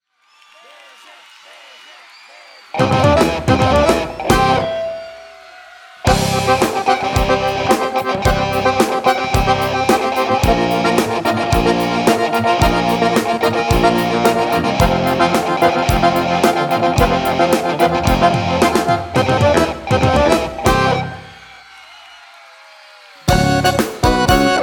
abertura mix ao vivo

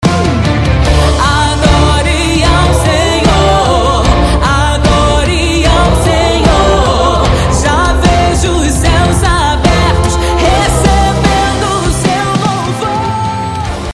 toque de celular

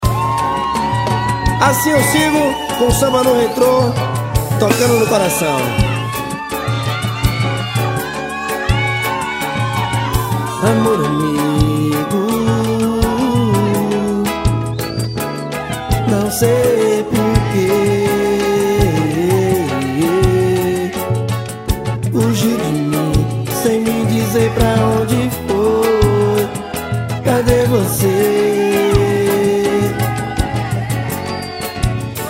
Samba Retrô

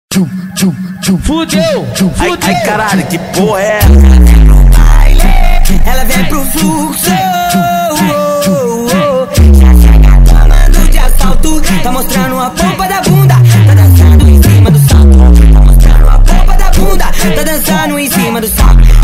funk graves

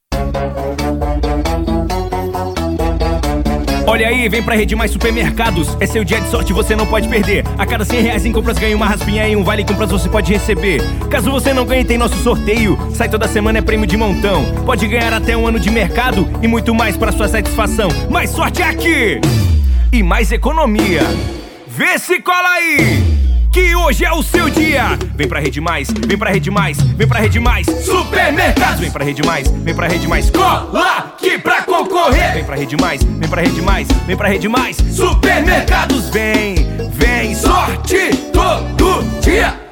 jingle comercial posturado e calmo; rede mais supermercados

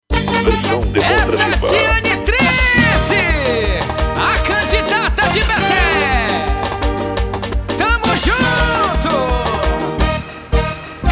jingle axe alegre; Jingles Politicos 2020; Axê Alegre Decidiu; STUDIO LAKO

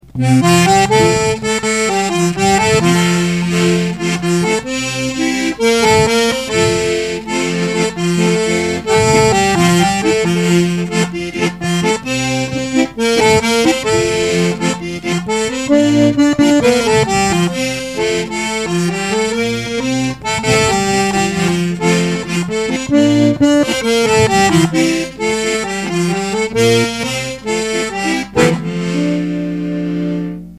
solo sanfona reisado folia de reis

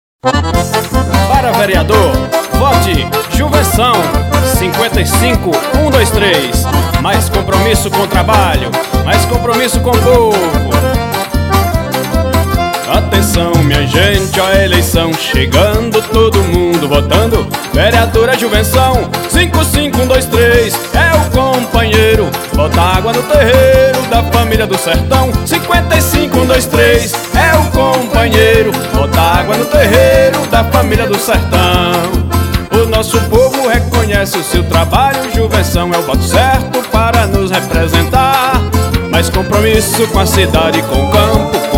jingle

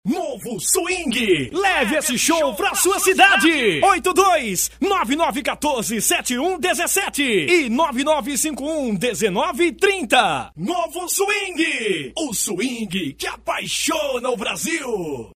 vinheta

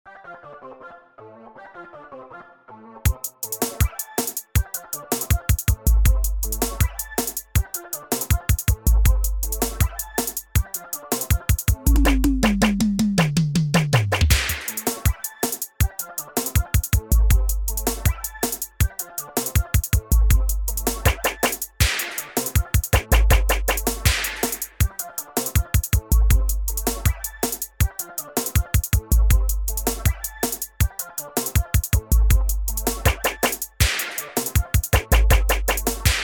base de brega funk

